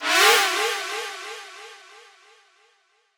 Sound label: Techno FX 135